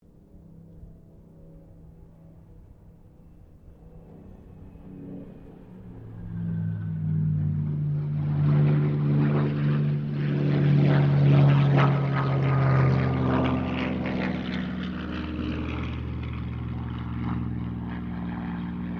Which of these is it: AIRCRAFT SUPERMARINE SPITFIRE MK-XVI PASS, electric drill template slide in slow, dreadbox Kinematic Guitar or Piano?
AIRCRAFT SUPERMARINE SPITFIRE MK-XVI PASS